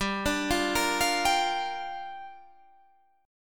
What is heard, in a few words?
G Minor 7th, Guitar, Drop D